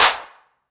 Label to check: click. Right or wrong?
Wrong